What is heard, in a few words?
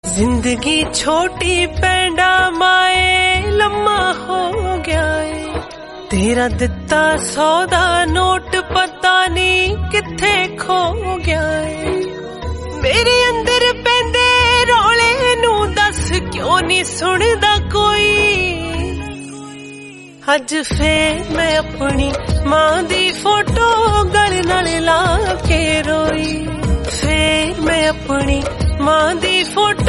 punjabi song